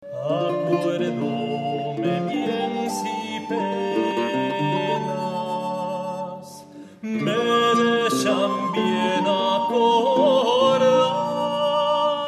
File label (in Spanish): quarteto